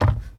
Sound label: hit ground